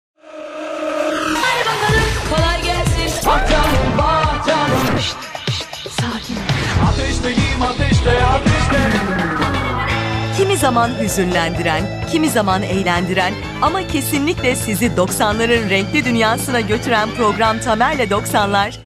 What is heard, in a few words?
sample melody